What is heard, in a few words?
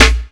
Claps & Snares